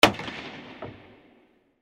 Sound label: bench board hits